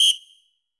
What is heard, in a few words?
WHISTLE; Greytsounds; Scott Peers Sound Engineering